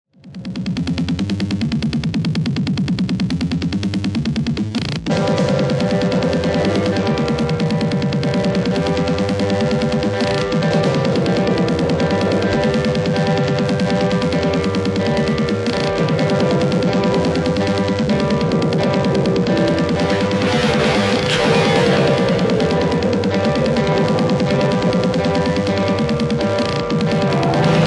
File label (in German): monoton monophon